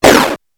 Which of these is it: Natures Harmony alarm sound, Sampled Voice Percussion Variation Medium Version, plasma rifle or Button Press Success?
plasma rifle